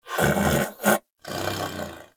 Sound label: Pet Snore; Warframe; Catbrow; PetShip